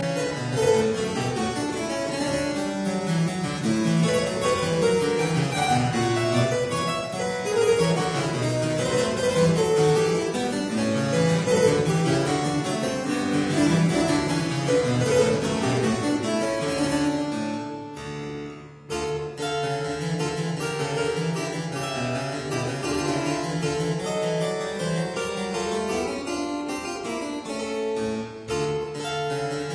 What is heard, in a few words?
Suite in E flat major, Minuet Trio